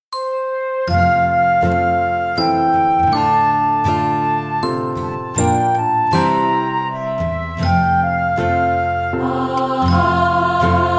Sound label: Oh Christmas Tree Choir